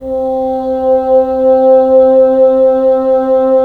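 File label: wind bassoon; LA Composer vol. 3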